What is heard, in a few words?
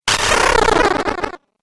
Game Glitch Effect, Video Game Sound Effects